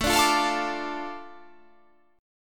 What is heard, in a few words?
A Suspended 4th Sharp 5th